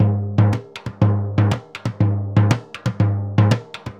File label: SURDO, DRUMS SAMBA; Best Service ProSamples vol.14; AKAI